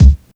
natural bass drum one shot c sharp key

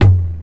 short tom f sharp key; tom drum samples; one shots

